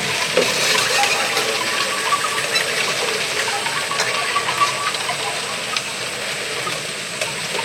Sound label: Bamboo Ambience